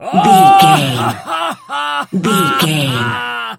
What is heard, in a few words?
voice male laugh intense